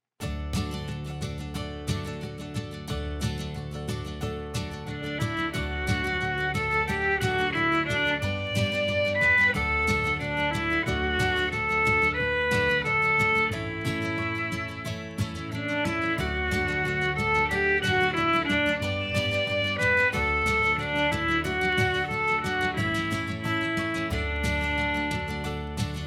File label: Violin